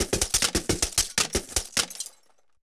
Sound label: Bullet Into Glass Multiple